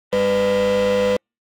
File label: lie detector buzz short; mystery-island